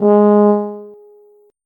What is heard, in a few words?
tuba note